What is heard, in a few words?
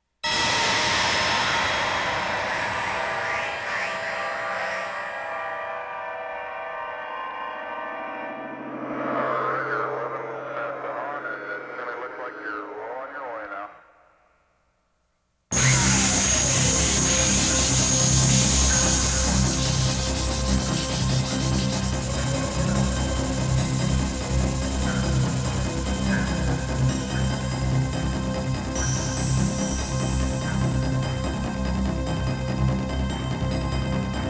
Heat noise